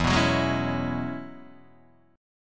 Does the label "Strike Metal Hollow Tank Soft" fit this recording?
No